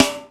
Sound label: R8 DANCE KIT; Rubber Chicken Software Sample Bank; DRUMS + PERC